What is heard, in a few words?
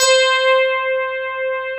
FM ELP C4 R, Keyboards Old School; USB Soundscan vol.09; AKAI; FM ELP 6